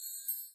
snow pea sparkles; soundspvz

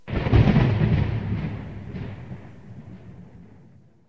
thunder; soundspvz

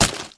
melon impact; soundspvz

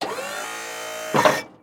hydraulic; soundspvz

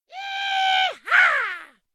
bungee scream; soundspvz

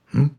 squash hmm; soundspvz

